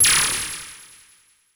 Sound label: CABL TEN, CABL TENS; Total Control - Action Cutz